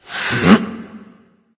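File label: grunt lift, male; smite; voicepack; rama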